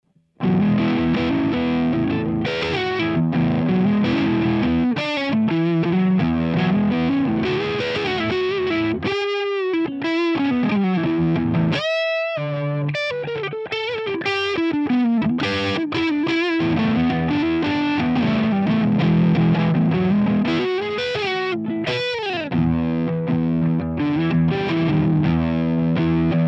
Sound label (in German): Laney VH100R Tuning II, Drive Gain halb, Drive halb